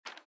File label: inventory Close